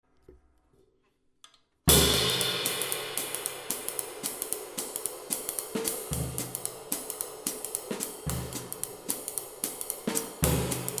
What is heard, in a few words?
gretsch